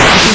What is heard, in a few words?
retro spaceship jet boost